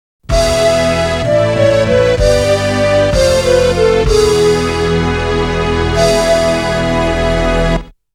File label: Ending Jingle; mario tennis 64 original sound track